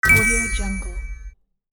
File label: bell win